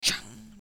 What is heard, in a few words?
Ching; Assemblee SFX